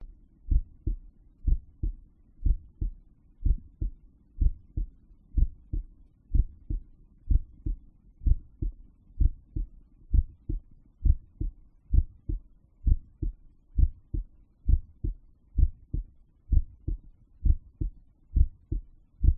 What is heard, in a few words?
heartbeat resting heartbeat at tricuspid